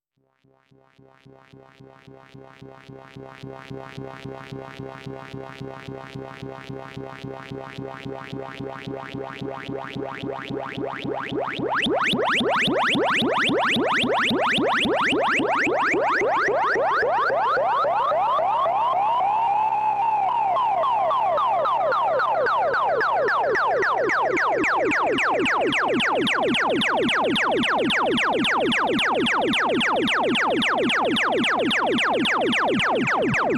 doepfer a 121 a 138j

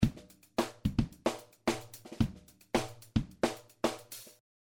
Drums FreqShifter

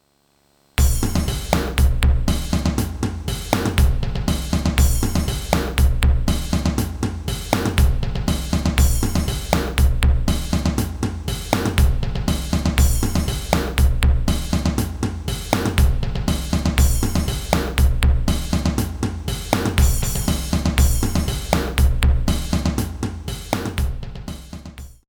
Realistic Drumkit; 1010music nanobox razzmatazz

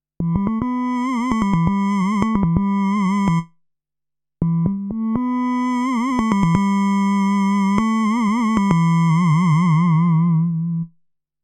Waldorf Modular Vibrato Patch Aftertouch

